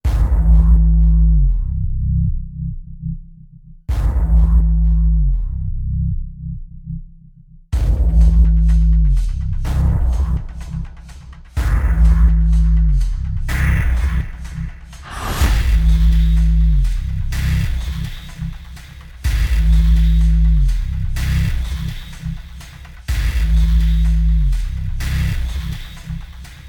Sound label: percussion only